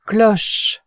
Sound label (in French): cloche